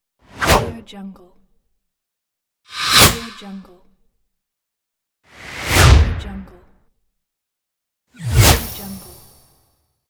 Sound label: woosh swoosh swish transition